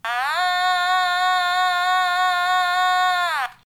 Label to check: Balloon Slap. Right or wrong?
Wrong